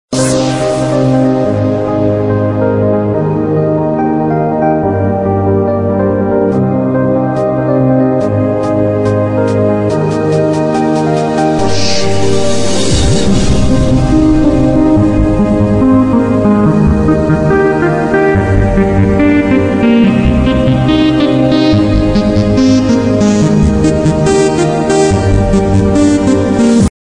Lovely Tune Ringtone

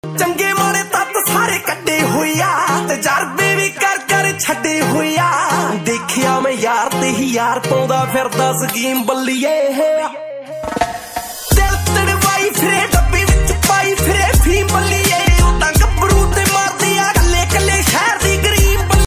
Desi Punjabi Ringtone

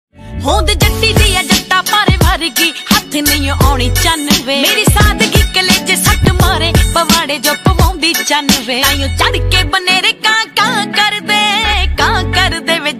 Punjabi Song Ringtone

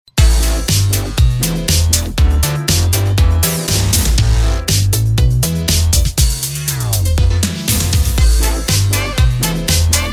Electro Swing Ringtone

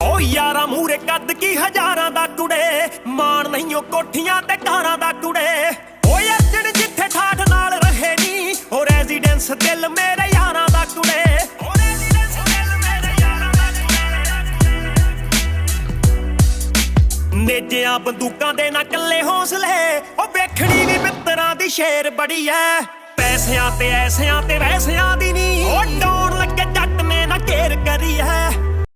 Punjabi Beats Ringtone

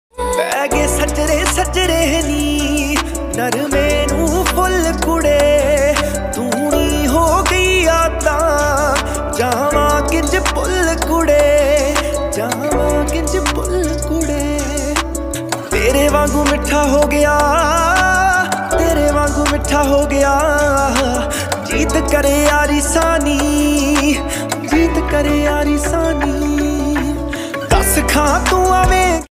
Punjabi Beats Ringtone

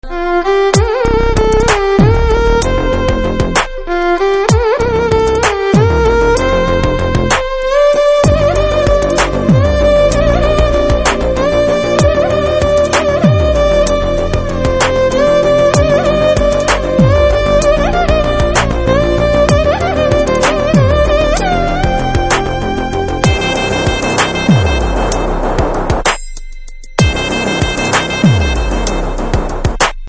BG Violin Tone, Telugu

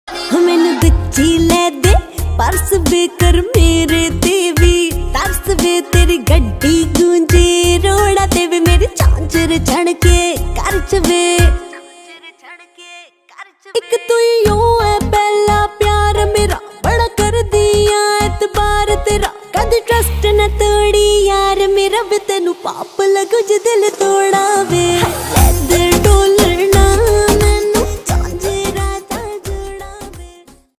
Punjabi Beats Ringtone

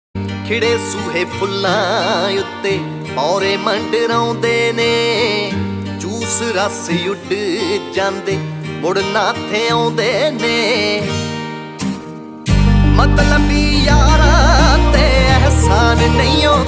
Dance Punjabi Ringtone

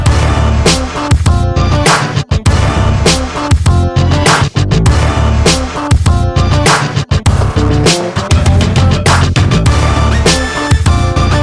Title Music Telugu Ringtone; Panjaa

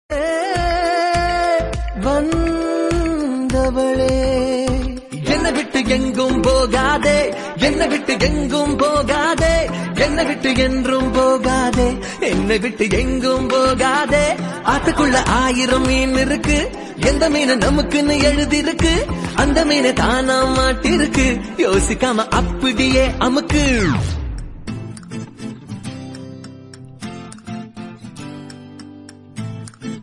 Tamil Ringtone

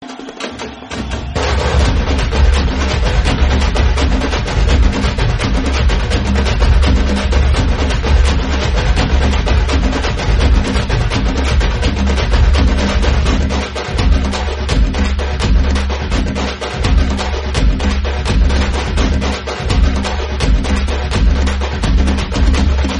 Bgm Telugu Background Music Ringtone

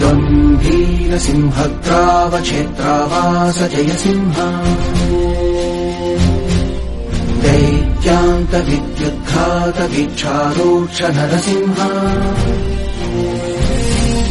Telugu Ringtone; Yamadonga

